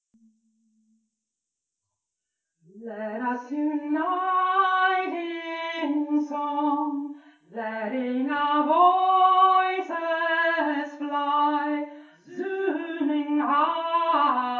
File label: zooming high mid